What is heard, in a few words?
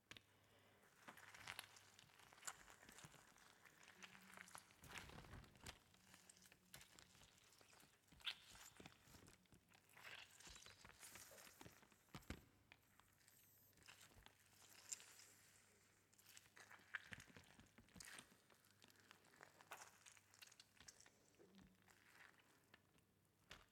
Blood Squirting Out Human Body